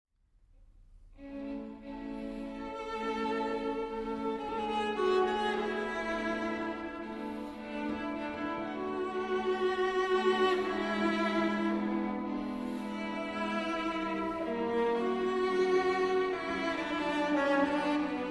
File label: String Quartet, Notturno (Andante); No. 2 in D Major III.